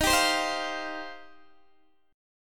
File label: D sharp 6th Add 9th, Guitar, DADGAD